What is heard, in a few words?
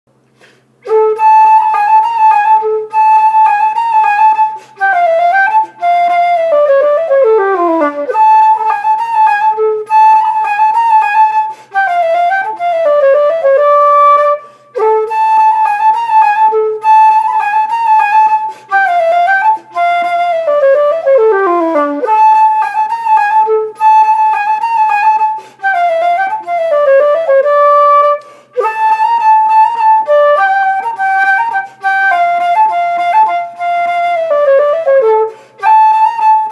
polska minor; eklunda